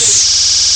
laser

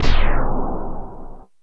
Explosion

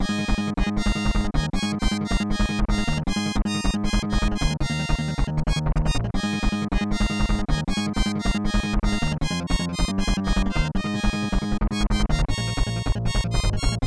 Unused Song; Animaniacs SGB

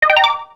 RED COIN; YWW

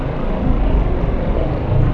Warning Sound; Unreal tournament

